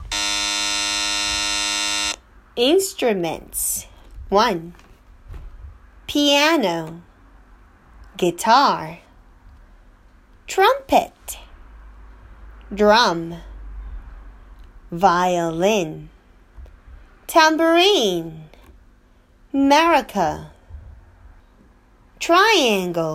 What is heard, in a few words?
Instruments